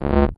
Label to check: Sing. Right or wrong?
Right